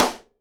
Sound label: SIDE STICK, Live Snares